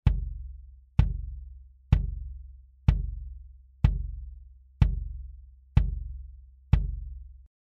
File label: M88 innen, bd mics